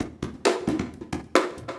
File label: percussion loop